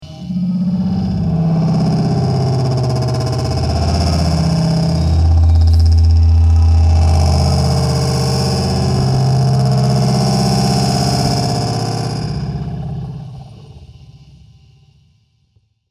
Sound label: stone rub